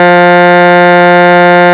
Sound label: heavy, horn; gmod